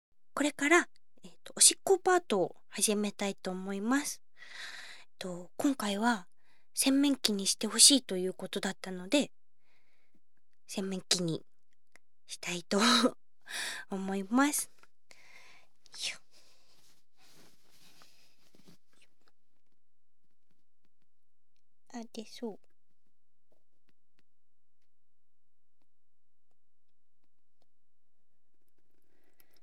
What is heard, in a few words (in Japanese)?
おしっこ